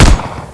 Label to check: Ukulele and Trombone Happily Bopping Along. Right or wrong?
Wrong